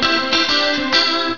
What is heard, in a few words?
STRUM; Simon and Schuster Interactive